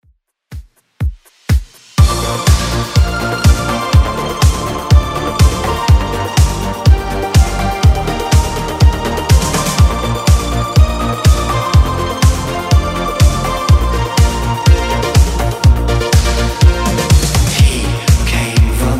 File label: 80s 123bpm; Redrum